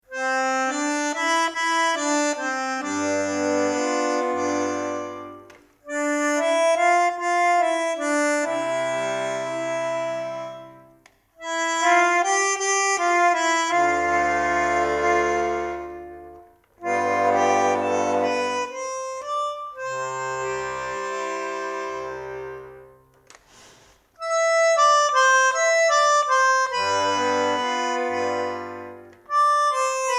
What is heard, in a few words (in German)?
Spaz C Dur, bandoneon